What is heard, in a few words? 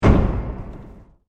Crate Explodes, video game sound effect; Andrew Fleming